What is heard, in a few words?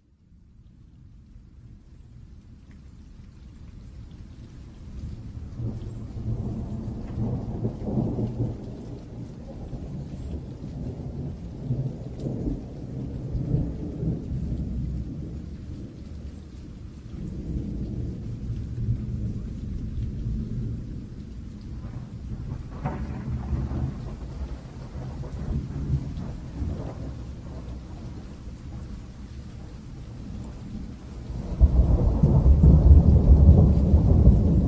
Thunder and Rain Sounds of Nature; 3D Sounds